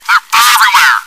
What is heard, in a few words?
run